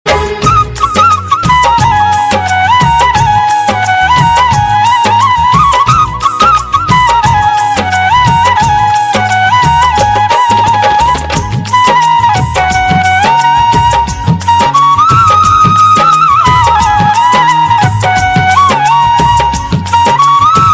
smart flute

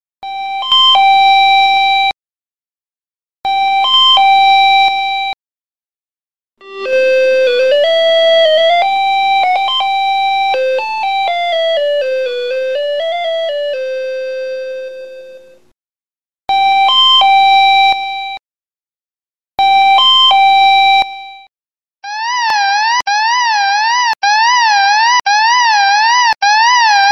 old style alarm

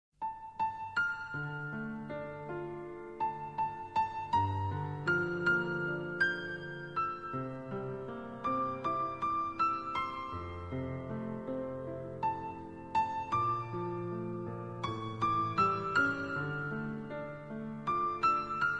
piano cover; sailor moon

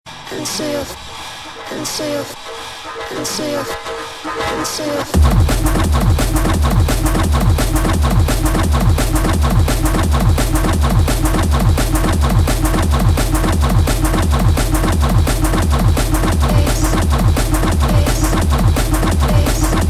fast music

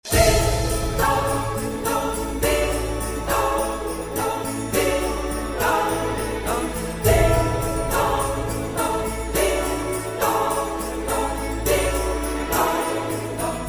ding dong

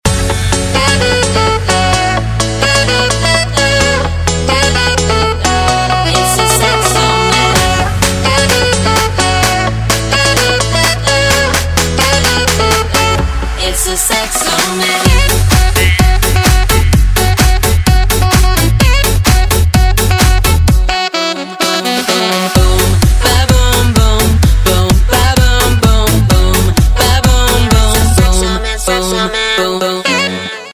the sax man

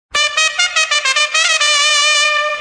trumpet sms; ringtone

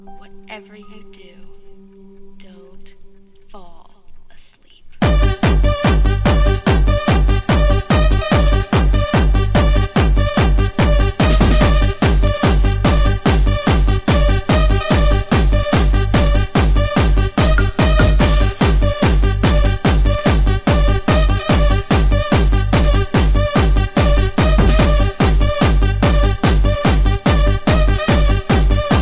techno remix, ringtone

